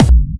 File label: Bassdrums Clubby Kicks; Vengeance Samples